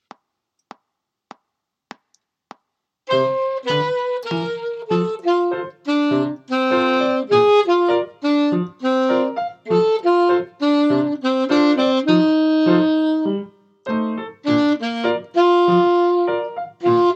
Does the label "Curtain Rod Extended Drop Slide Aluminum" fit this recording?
No